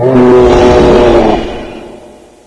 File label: muffle scream